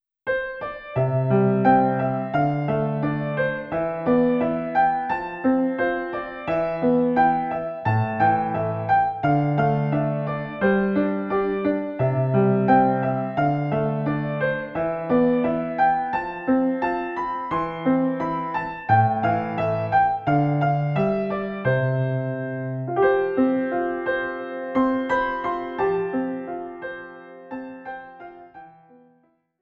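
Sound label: background music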